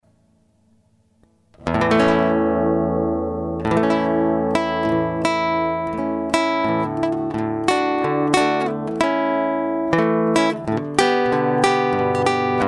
guitar

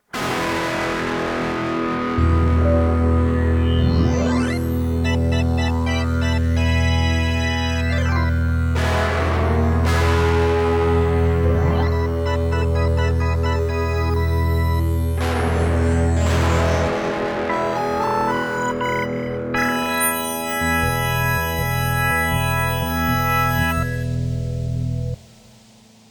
electric

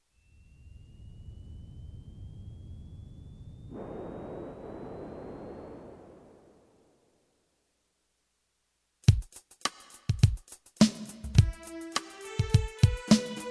Instrumental

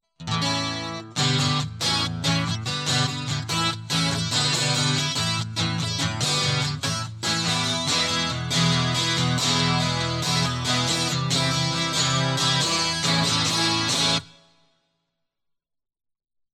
Guitar Loop

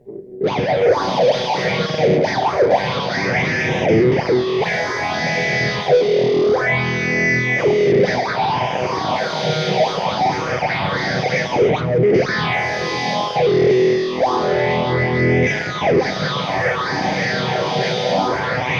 riff

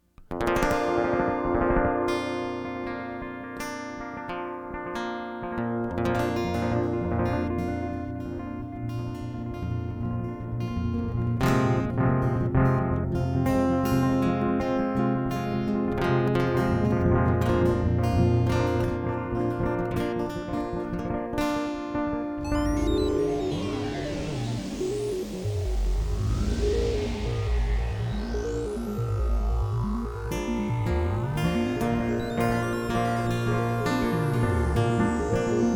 acoustic space intro

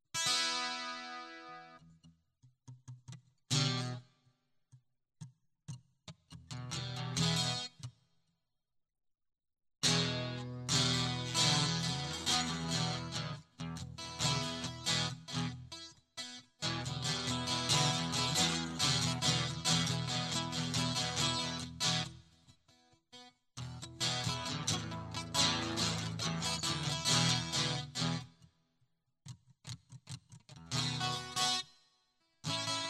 Guitar

